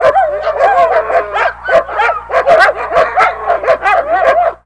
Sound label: DOG, 狗; 动物; 家畜; 特酷声音素材库